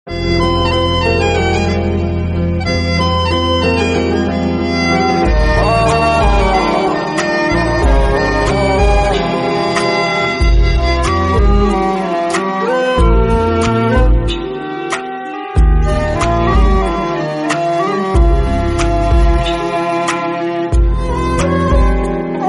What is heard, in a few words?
attitude ringtone bgm english ringtone bad boy